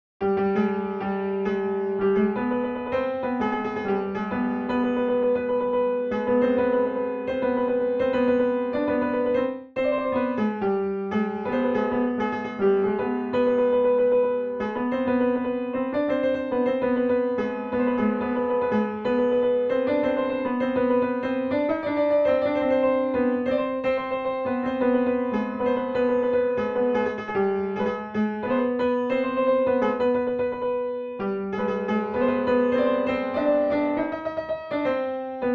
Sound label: Zabol Chahargah, piano; Radif Mahjoubi